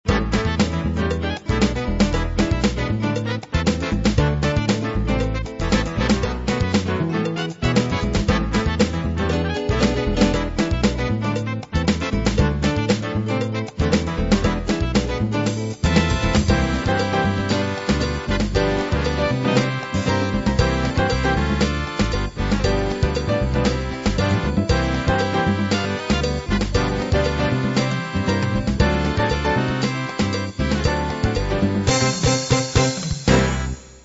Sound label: jazz mambo